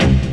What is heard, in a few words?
Chunk Hit